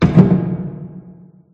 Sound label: Kick; Five Nights at Ronald's